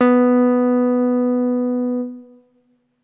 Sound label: deep B2